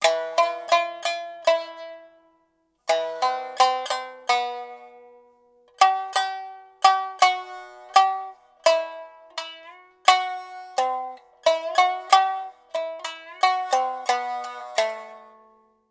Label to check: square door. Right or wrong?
Wrong